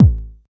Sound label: dope kick single hit e key, kick drum sample tuned to the E note; kick drum samples